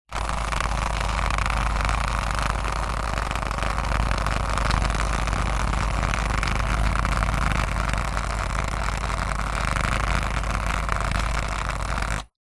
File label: massager vibrating set down on tiles rattle